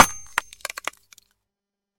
ledorub bt po ldu udar

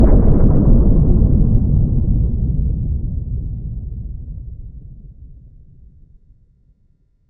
dolgiy raskat, zvuki atomnogo vzryiva

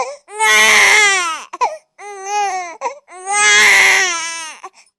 mladenets plachet gromko

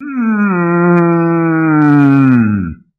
chelovek stradaet boleznennyiy vzdoh

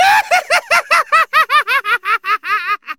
kloun smetsya raskatisto

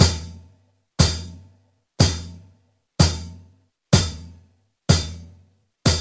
prujina igraet uprugo, zvuki barabanov

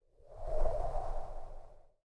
vozduh snaruji rezkiy natisk, zvuki skvoznyaka

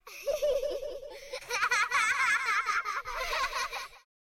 estestvennoe vesele rebnka, zvuki detskogo smeha

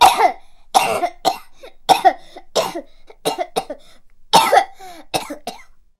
pristup kashlya dolgiy, zvuki kashlya rebenka

